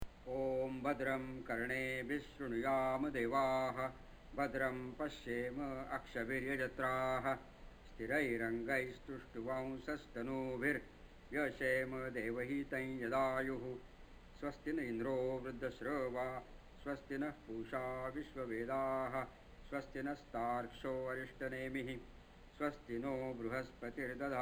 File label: om bhadram mantra